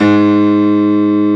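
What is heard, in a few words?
reverse G#1, piano; AKAI S-Series CD-ROM Sound Library VOL-2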